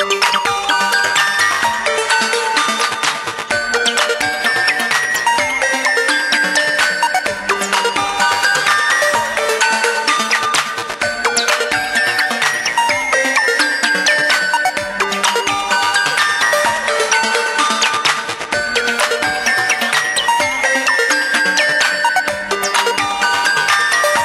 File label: Htc Music Box Ringtone